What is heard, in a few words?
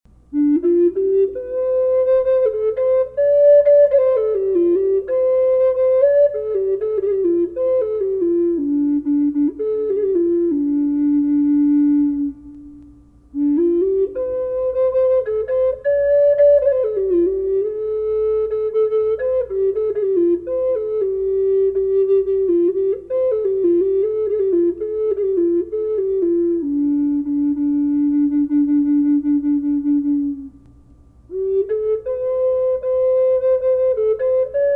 reverb D flute; andes condor; collector flutes